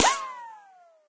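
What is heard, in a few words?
ricochet, weapons; mistake coop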